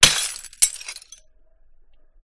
Glass Shards Smash Hard Impact Rich Sound of Lots of Small Shards Clinking; Skyes Audio